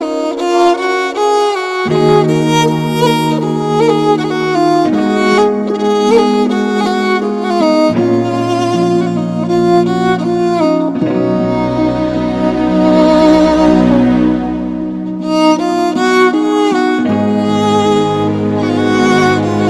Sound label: Extreme Sad Violin